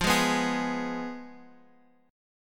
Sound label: F Diminished Guitar Open F; x,x,5,3,2,3; Fdim strummed; JGuitar